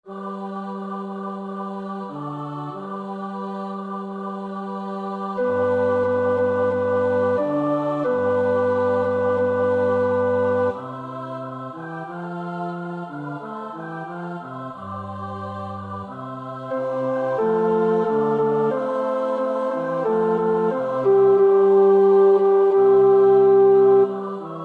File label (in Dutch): tenor 2, koorpartij oefening; Carissimi (Giacomo); Historia di Jephte